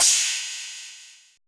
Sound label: CHEEZE CRSH, TECHNO; Drums In Your FACE!